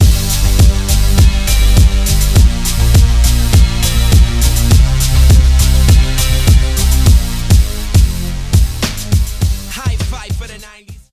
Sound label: Stinger; Urban Central